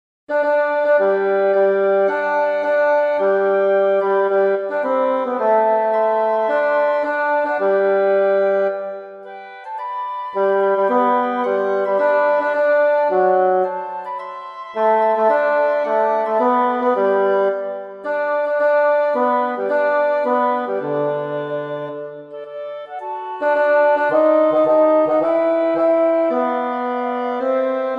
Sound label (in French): Marseillaise Basse